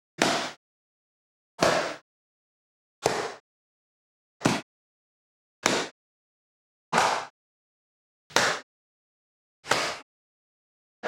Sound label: Ketsu Drum Set; Herrington